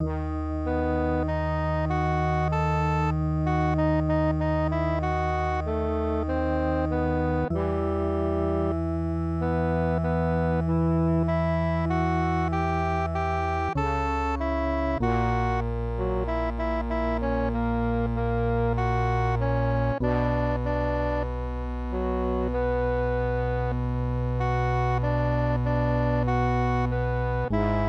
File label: MIDI